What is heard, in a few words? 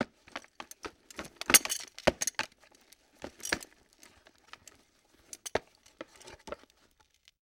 Camera Movement On Tripod; Sony Pictures Sound Effects Series; Period Devices